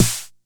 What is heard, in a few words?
Snare 909; gate experiments